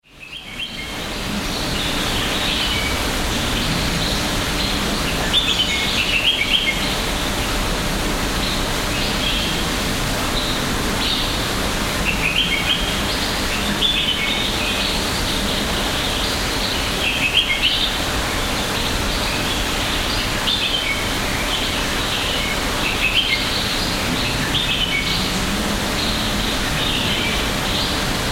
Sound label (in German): Zoo Regenwald